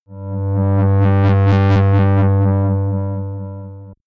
shot alien tension; sweeps swells; comedy-cartoon; soundpool

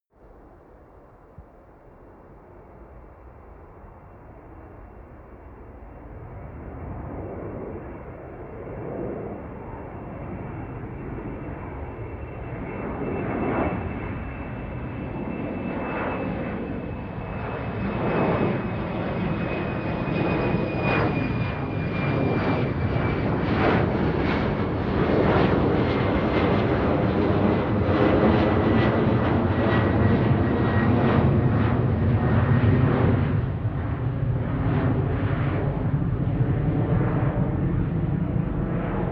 plane flyby land tonal howl; flugzeuge verkehrsflugzeuge; verkehr transport

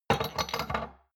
shot glasses place multiple medium; berufe; gastronomie; soundpool

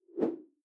whoosh simple short tonal; swooshes-swishes

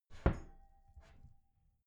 wooden door close soft glass clang

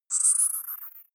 bonus up, signale

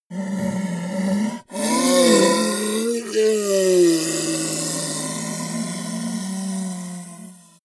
ogre dying groan weak; kreaturen

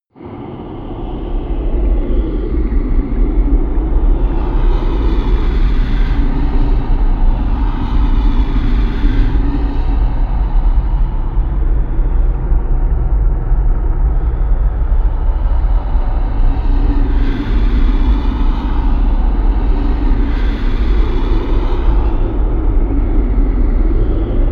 atmosphere voices from hell, Horror

